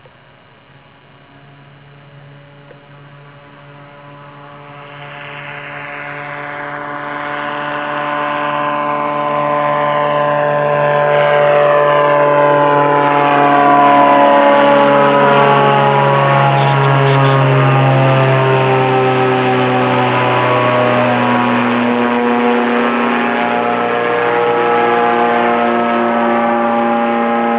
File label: rotax 912 takeoff; skyarrow